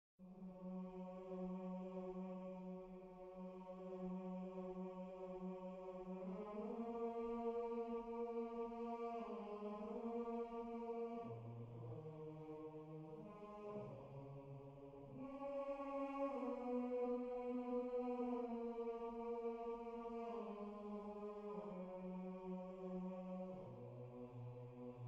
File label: Choir Bass Part